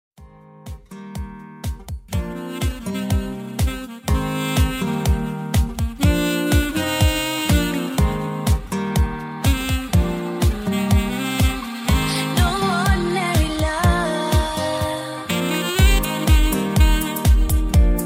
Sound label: sax